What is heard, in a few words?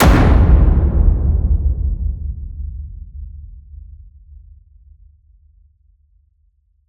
HIT Epic Drums, Cinematic Hits Designed